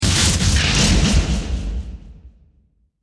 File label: Production Element Stinger Metallic Whoosh Stutter Hits; Stingers